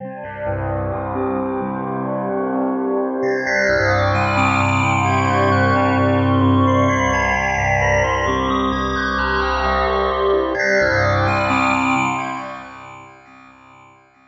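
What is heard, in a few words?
bubble goo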